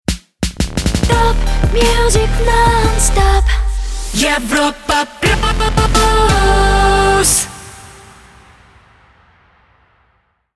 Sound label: Jingle Rapid Fire; Europa Plus